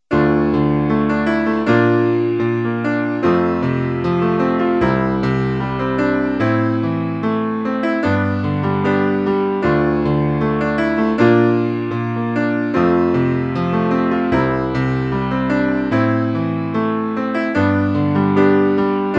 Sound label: Improv chords Solo